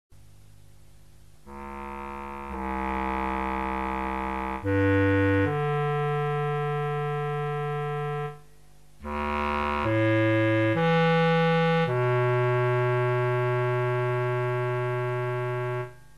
ship, music; Goblins3

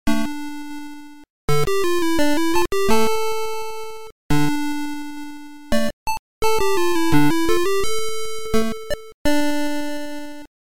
Scary Forest, tandy; KQ4

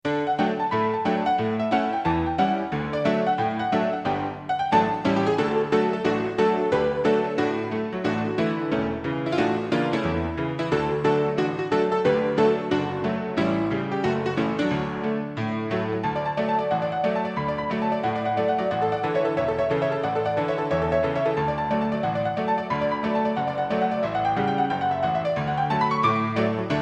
Billiard Room Player Piano; LB1

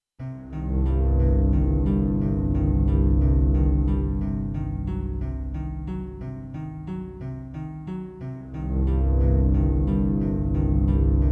Underwater; KQ1SCI

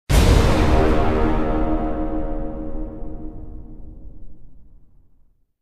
prop fail; Hulk UD

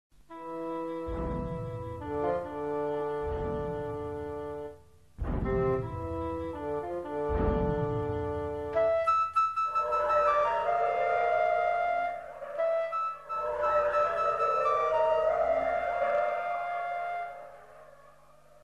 death, music; Goblins3

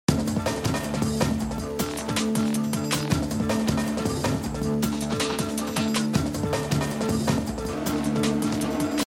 DRM LP; Bourne

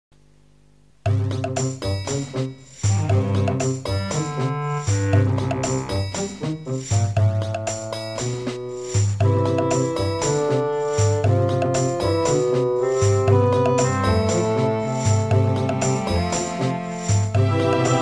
country, music; Goblins3

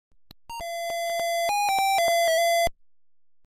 gameover; Mouskattack; apple

